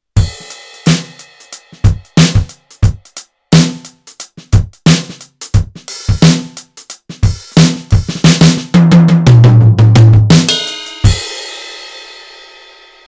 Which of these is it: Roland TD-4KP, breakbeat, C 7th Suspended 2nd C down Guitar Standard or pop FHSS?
Roland TD-4KP